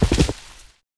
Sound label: Horse Run Forest; Civ4 warlords